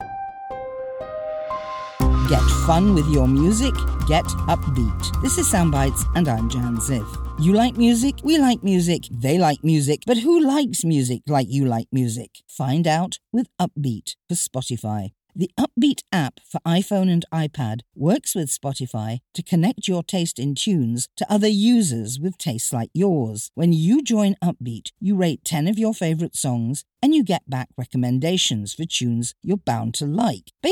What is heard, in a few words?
upbeat